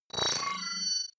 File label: bubble tonal, sweeps swells; comedy-cartoon